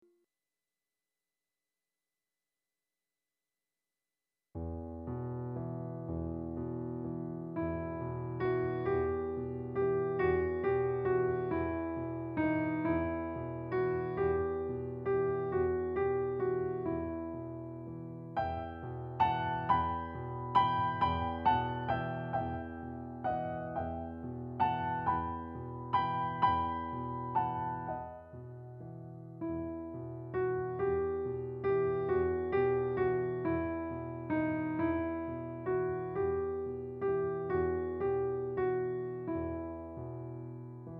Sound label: Spanish Folk Song